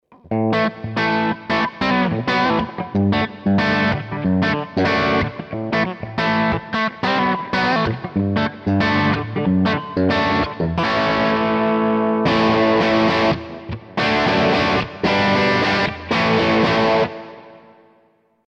Epiphone Les Paul 60s Bridge PU Crunch

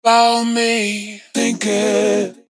Vocals White Label Vox

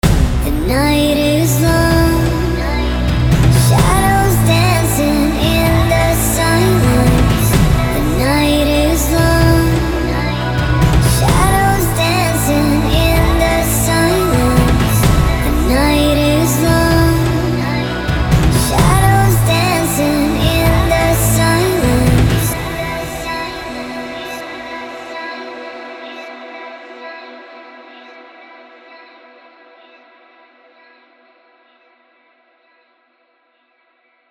VC La Capella; Vengeance Sound Avenger 2; Melodic Tech Voices 1

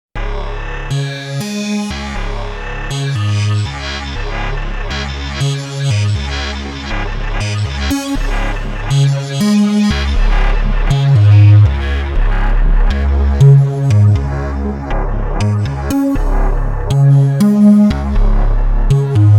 Sequential Pro 3 Synthwave

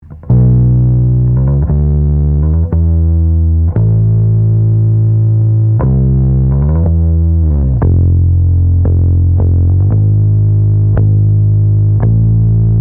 Hoefner Bass Ampeg U87

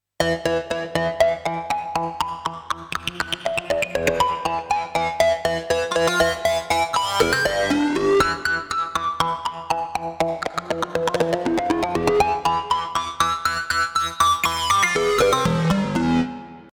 russian filter sequence

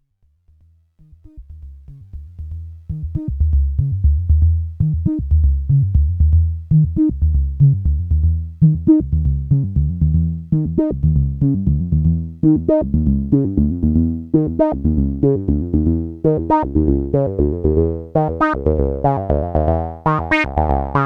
wasp 3x Filtersweep

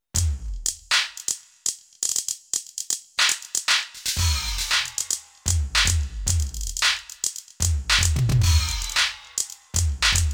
SIMMONS SD200 Kit SDS V

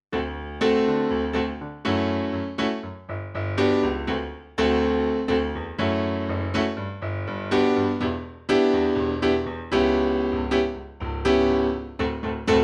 Yamaha P 125 Piano

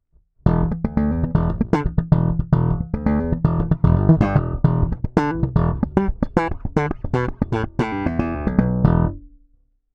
Harley Benton BZ 5000 Neck PU passive Slap

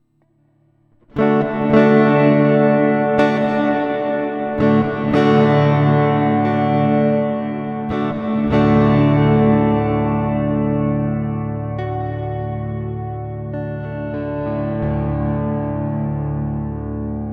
Cathedral

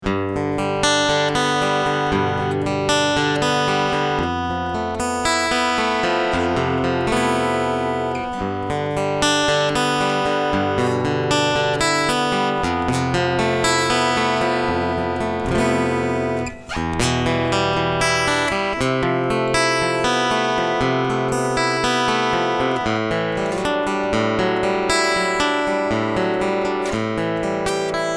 Picking Preamp

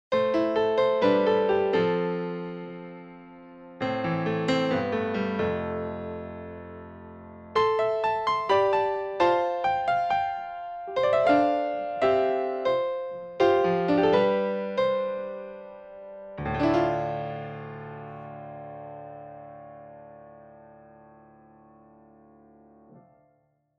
Kontakt7 Grand Piano

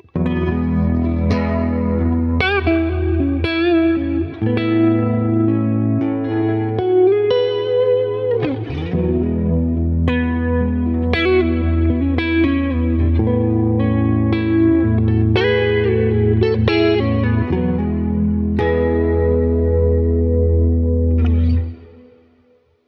Prussian Blue Reverb w Chorus

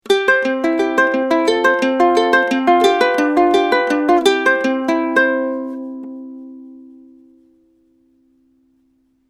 U87 Ukulele

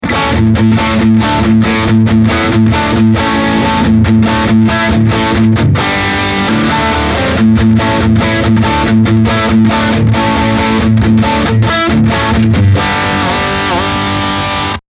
Rhythm Heavy

